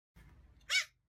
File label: axolotl hurt; Minetest; VoxeLibre; mobs mc